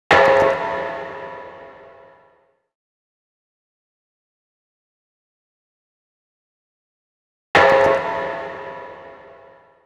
129 Trance Bm Sfx, Electro Synths; Prime Loops; Complex Electro Synths; TranceOut

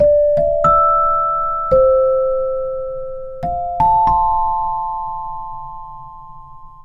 Bpm140 Somatic Celesta Loop D; Dubstep; FX Loops

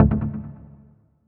Synth Shot D; Mutekki Media; Vengeance Electroshock Vol.2

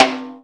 Toms

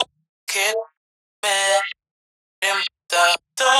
126 VOCAL LOOP, Funky Underground; Loopmasters; Funky Underground Vol 2

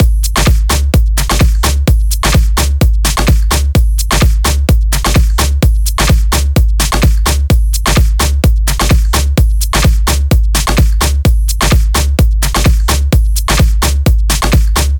Dirty Dutch Kit Beat; Mutekki Media; Vengeance Electroshock Vol.2

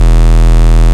Bass Shot E

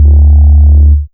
Bass Shot D

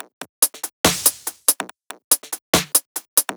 Bpm142 Top Loop; Perc Loops

